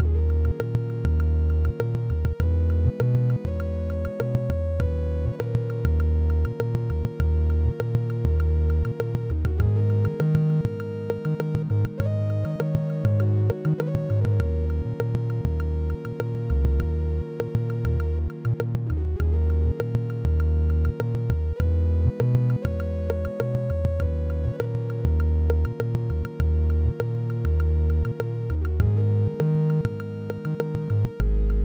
Sine Wave

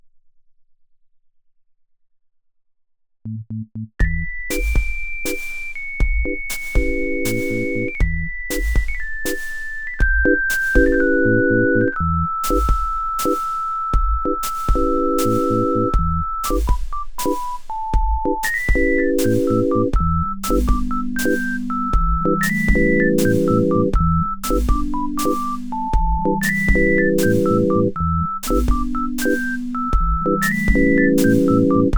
The Sine

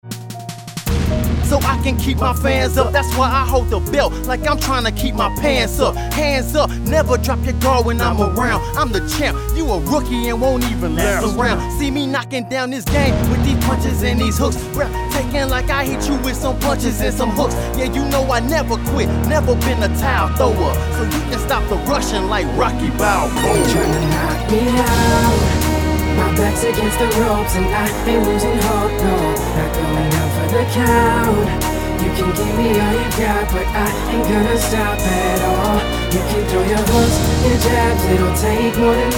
Knockout

